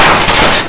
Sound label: smash